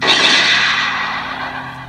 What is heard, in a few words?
cannon shot